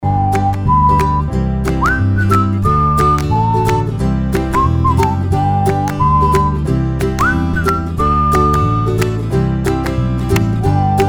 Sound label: clapping ukulele